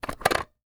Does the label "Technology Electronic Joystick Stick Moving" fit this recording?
Yes